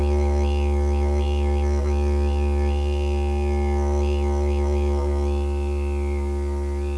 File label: didjeridoo